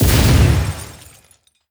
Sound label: Building Part Smashed; rampage arcade gamerip 2018